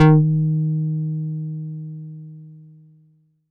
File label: Italiano d#4, Korg Poly-800